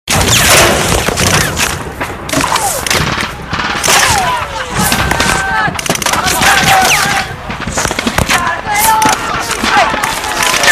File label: Amb Battle Beach